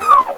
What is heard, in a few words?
Factory noise; memory-sound; gcompris-qt